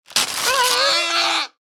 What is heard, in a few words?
Giftwrapped Whelp Death